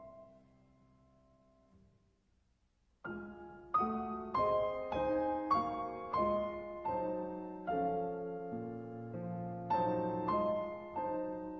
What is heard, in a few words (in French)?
Etudes Pour les sixtes